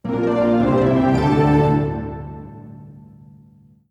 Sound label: jingle; sfgame